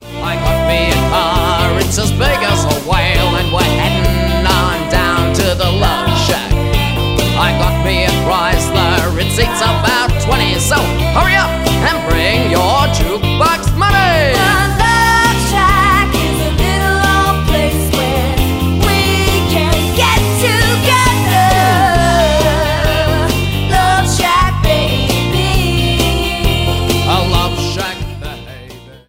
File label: Corporate Party Cover Band